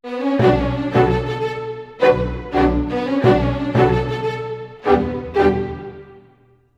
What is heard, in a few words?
85 b min full mix, Orchestral arrangements; PowerFX; Downtown Orchestra